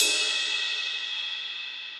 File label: Ride Edge Old School; Drums; Drum Hits; Standard Hits; Ableton; Session Drums Studio